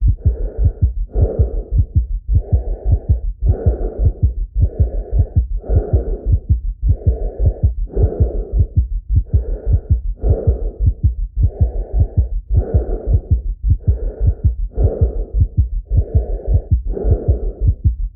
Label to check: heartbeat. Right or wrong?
Right